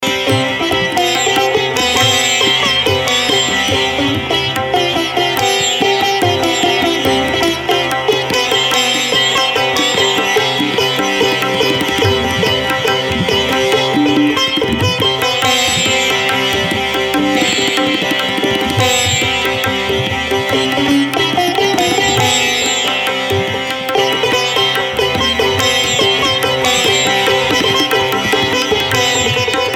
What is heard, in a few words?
raag bhairav madhya teentaal